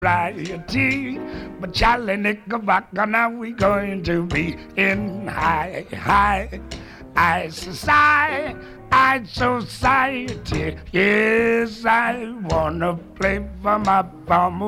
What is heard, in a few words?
Calypso